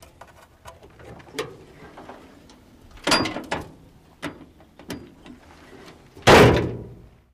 download Sound, Chevrolet Corvair, Interior Pov, Trunk ( Bonnet ) Open and Close.